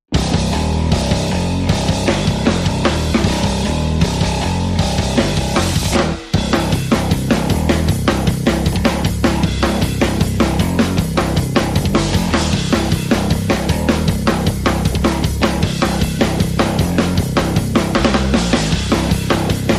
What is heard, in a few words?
Drums and Bass Only